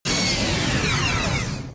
Wave

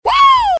Whooooo, Paroles; bruitage

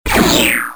Laser

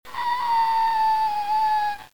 Cri scream, Halloween; bruitage

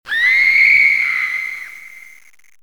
Cri scream, Halloween; bruitage

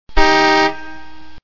klaxon car; Dieppe

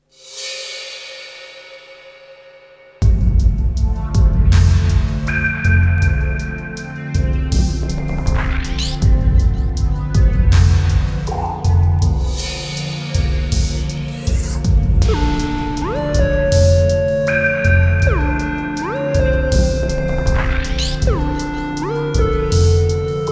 suspense

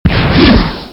Bazooka; bruitage; Armes

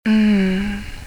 Hummmmmmmmm

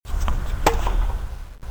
Tennis

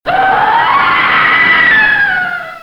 Cri scream, Halloween; bruitage

